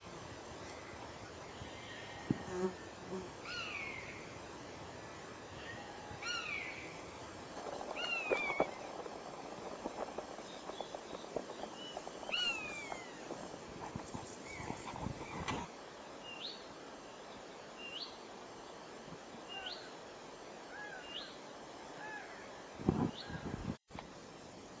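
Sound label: honey buzzard calls; northumberland 2004-5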